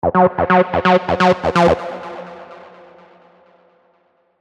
Synth Fills F (128 BPM)

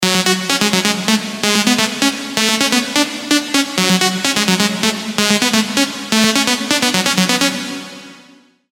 Big Room Leads F# WET 128 BPM

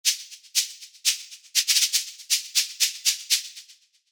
PC Maracas; Spire

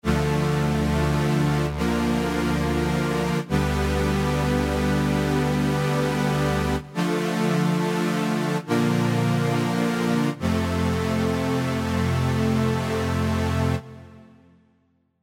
KS More Saws, SERUM KEYS

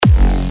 Big Room Kick F#

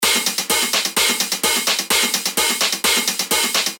128 BPM

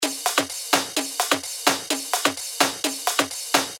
128 BPM; Favela

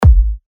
Punchy Kick F; Big Room

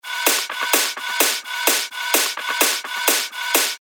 128 BPM

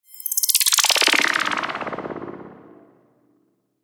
Downlifter; SERUM FX

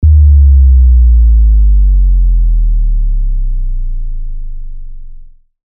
Sub Boom D# (128 BPM); Revealed Buildups Vol. 3

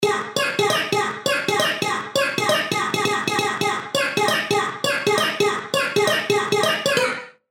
G# (134BPM)

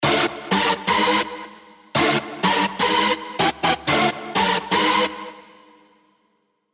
Hell Stab; SERUM STB

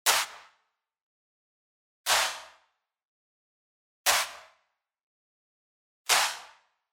Wide Clap; Spire

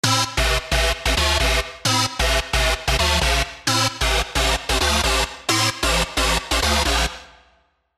Stab; F Society; Spire

